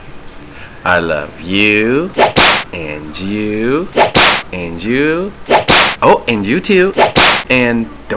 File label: ROOM SLAP; Oneliners